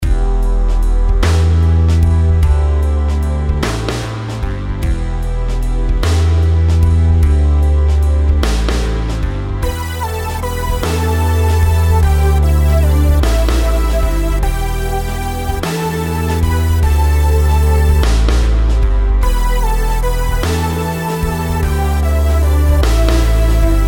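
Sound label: melodies compound 8s E minor; melody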